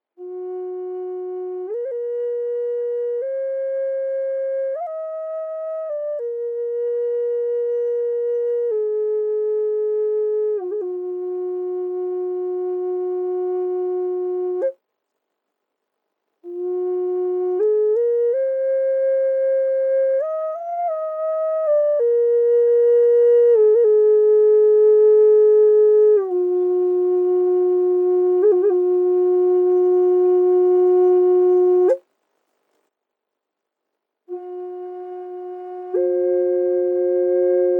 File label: drone flute, 432 Hz; fis 432hz; ahorn; indianerfloete doppelfloete